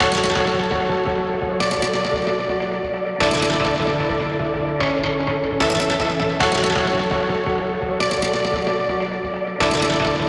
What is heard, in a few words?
Synth F#m 150, Trap Kits; HipHop; Ghosthack; Gone